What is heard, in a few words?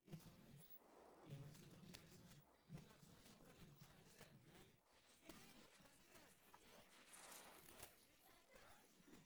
happy no vocals